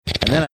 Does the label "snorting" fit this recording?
Yes